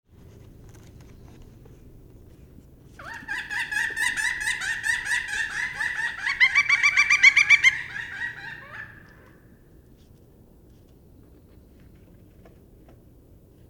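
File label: sounds of pileated woodpecker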